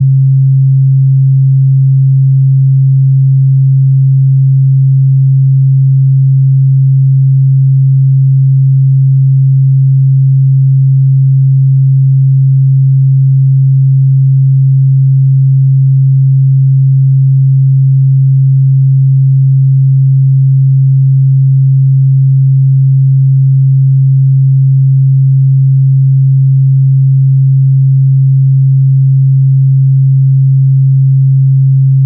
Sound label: 134 SINE LOG; FUNDAMENTAL; SINE WAVEFORMS